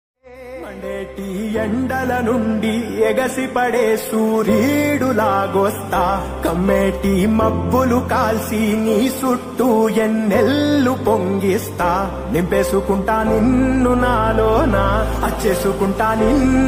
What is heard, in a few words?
Tamil